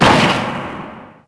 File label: Impact